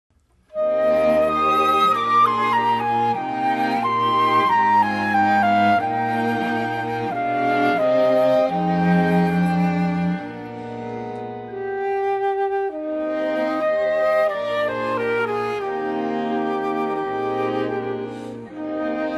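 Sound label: Christmas song, Flute Quartet and String Quartet; Christmas Complete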